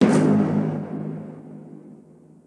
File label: Hit